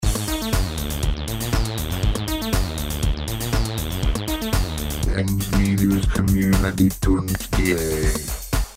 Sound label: jingle